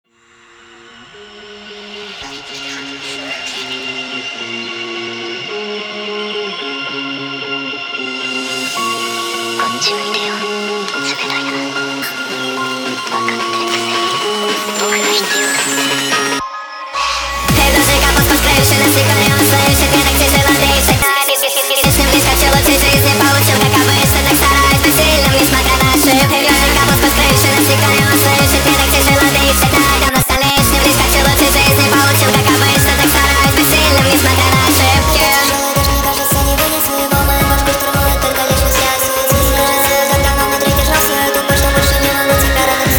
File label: nightcore